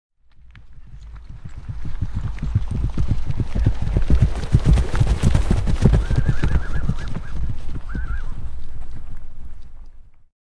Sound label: 2 Horses Trot By; Historical; Hollywood Edge Historical Series; War Horses Armies & Weapons